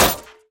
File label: metal bullet hit; hits